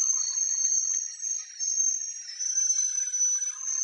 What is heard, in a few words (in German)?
bremse quietsch, volvo 9900; Vehicles; AI volvo 9900; Freyfurt